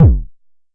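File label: Jomox MBase kick; drum machine; Jomox MBase 01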